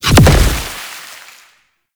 Impact A; Gouache; Warframe; Follie

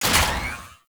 Ship Fighter Platform Start; Warframe; Corpus; Ship Remaster

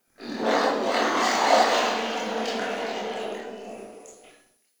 Digest Interior Small; Ambience; Warframe; Sentient Lair

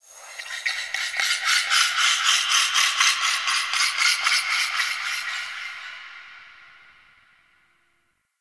Sentient Texture Small B; Warframe; Sentient Lair

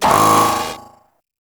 Cannon Hack Failed Stinger; Makvoss Cannon

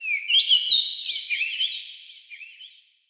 Forest Open Glade Bird Med Two; Day

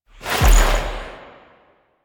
Drifter Pull; gameplay; Warframe; NineteenNinetyNine; Stage12PartyOfALifetime

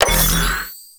Key Pick Up; Warframe; Nineteeen Ninety Nine; Lich Chip; Inf Lich

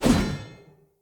Player Sphere Polearm Heavy Whoosh; Weapons

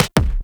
Weapon Punch Double

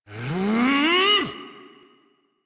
Exertion Adult Operator; Warframe

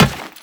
Run Metal, Footsteps; Warframe; Teshin

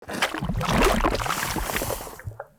Venus Landscape Coolant Bubble; Ambience; Warframe; Venus Open World

